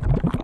hit slime

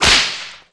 Whip impact

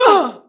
Hit

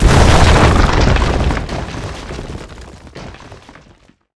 DIE

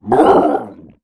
gladiator DMG

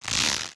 ARROW

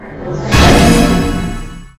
magic protect

